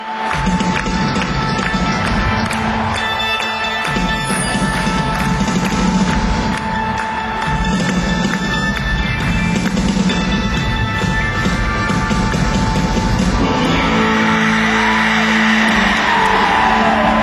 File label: Drum Solo; Paris, France; June 23, 2023